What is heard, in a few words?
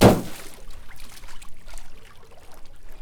water slap